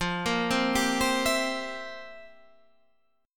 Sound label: F Major 7th Suspended 2nd Suspended 4th, Guitar, Standard; FM7sus2sus4 arpeggiated; JGuitar